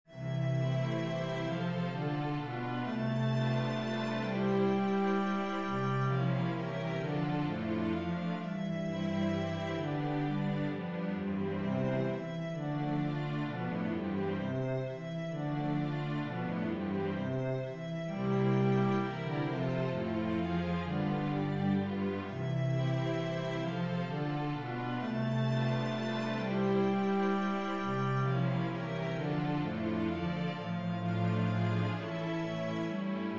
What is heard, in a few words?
concerto e minor, cello